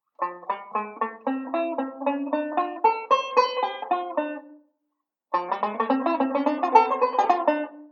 ii V Pattern, banjo

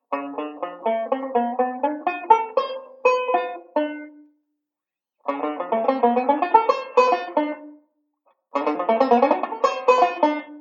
Octave Arp, banjo; Beyond Chord Melody Volume II

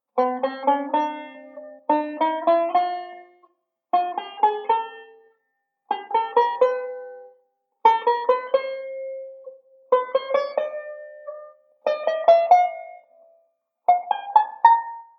ii V 2nd String Notes, banjo; Beyond Chord Melody Volume II

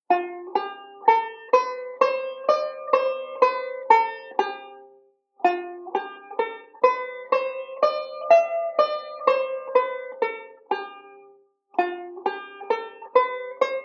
F Lydian, banjo; Beyond Chord Melody Volume II

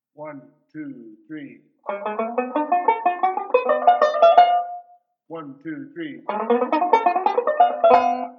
ii V Pattern, banjo

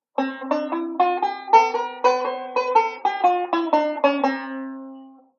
Bebop Scale; Beyond Chord Melody Volume II

